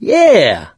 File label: positive noise, mechanic, survivor, voice; source engine; left 4 dead 2